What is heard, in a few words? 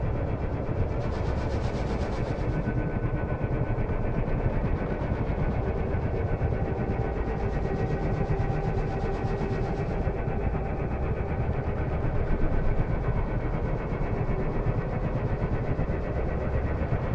megastructure gate way portal hum; apocalypse